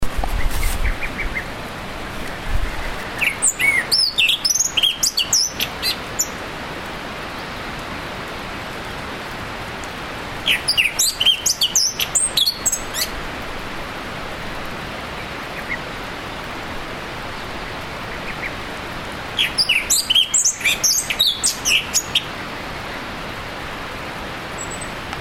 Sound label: thrush himalayan forest Zoothera salimalii